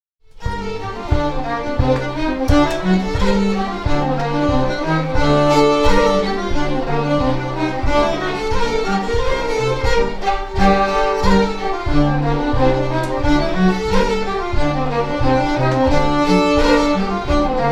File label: Hornpipe, Scottish Fiddling; The Jink & Diddle School of Scottish Fiddling